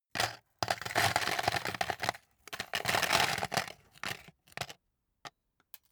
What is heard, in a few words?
paperclips drop paper box